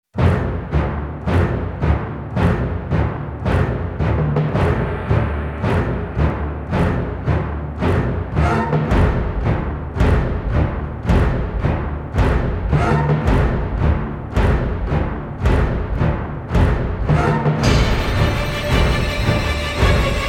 no choir

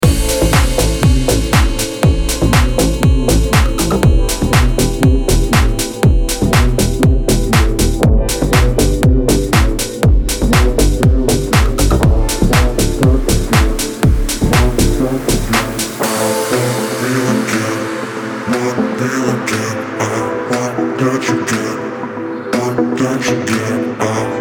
deep violin club mix

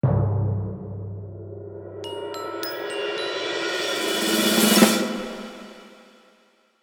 perc

